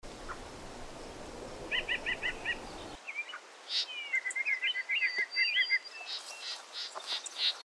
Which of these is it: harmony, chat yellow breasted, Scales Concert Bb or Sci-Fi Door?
chat yellow breasted